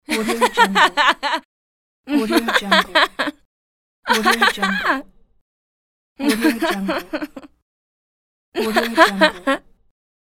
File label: happy woman laugh